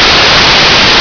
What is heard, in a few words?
white noise